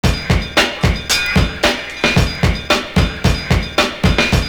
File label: LIKE GAMELAN, LOOP